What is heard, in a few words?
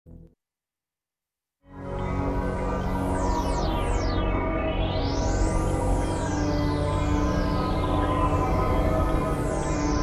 terminate instrumental; terminator dawn of fate ps2 rip